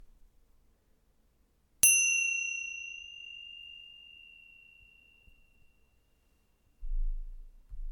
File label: Chimes 4F